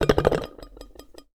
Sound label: Base Wobble, Medium Wine Glass